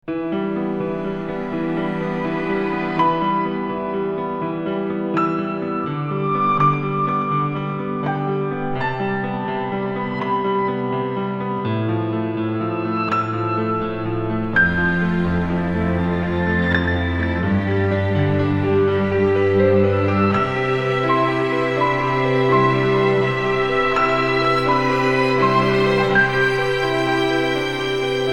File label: Ambient